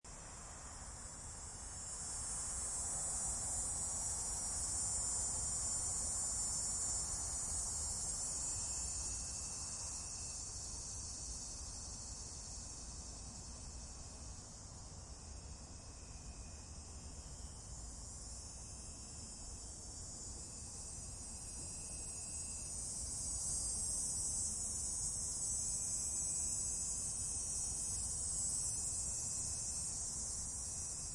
michigan cicadas

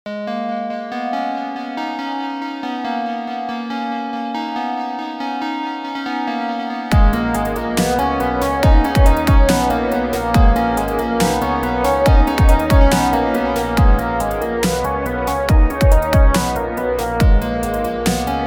video game boss fight music

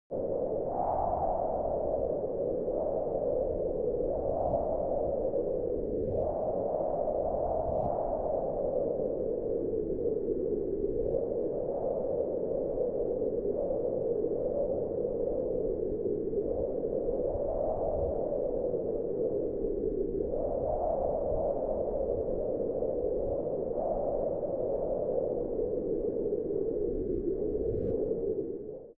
abstract wind howl interior

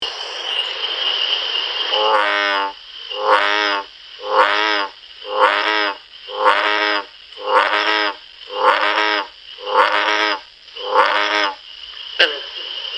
bullfrog croaking

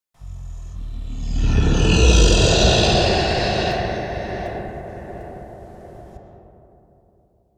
echoing roar

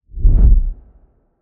whoosh end sound

